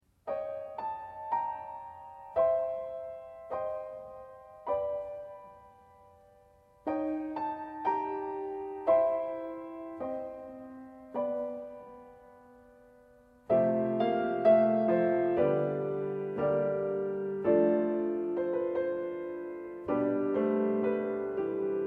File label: piano